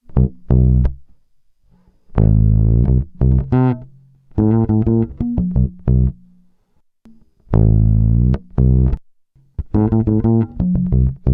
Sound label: Bass